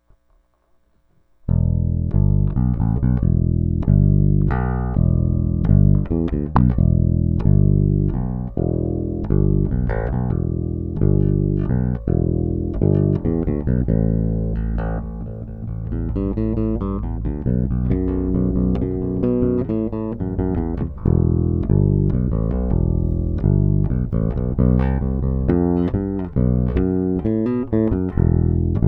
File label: bass